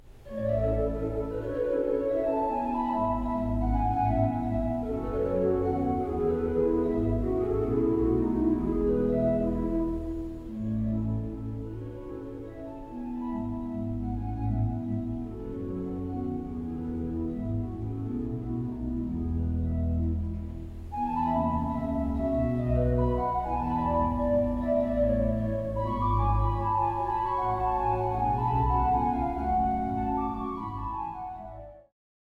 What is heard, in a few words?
Flute Solo